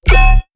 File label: BASS ONE SHOT; NANI